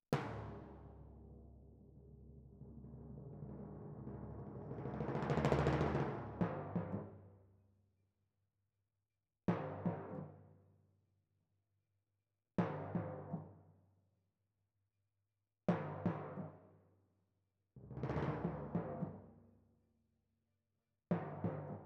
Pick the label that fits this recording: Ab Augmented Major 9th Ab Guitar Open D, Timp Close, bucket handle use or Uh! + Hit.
Timp Close